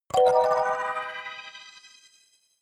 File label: notification kalimba magical minor high